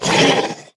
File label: Monster Attack; Monster Lizzie; rampage arcade gamerip 2018